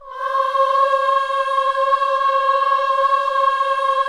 KIDS CHOIR, Gospel

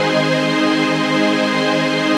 PAD, Dance Mega Synth

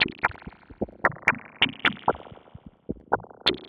Bubbly, Underground UK House & Electro, Synths; Sonic Foundry (Sony Creative Software)

